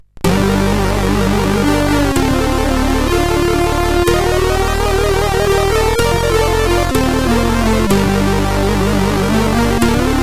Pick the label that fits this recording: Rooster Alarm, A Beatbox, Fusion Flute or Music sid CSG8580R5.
Music sid CSG8580R5